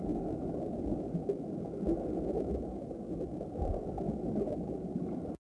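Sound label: ambience Underwater; Star Wars Obi Wan; XBOX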